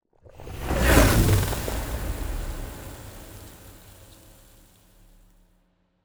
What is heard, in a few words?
ship destroyer move; ships; Stellaris; toxoids